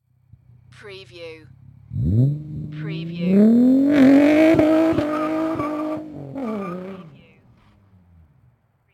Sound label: INFINITY G35 EXHAUST TAKEOFF